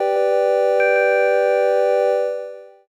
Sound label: church